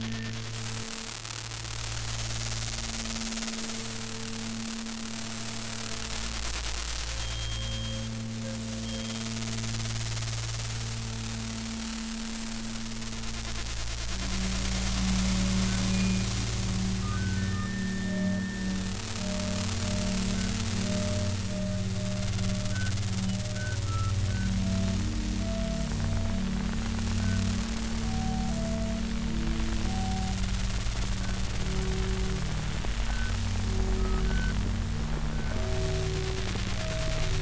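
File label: designed drone harmonic electric drone; Sonniss GDC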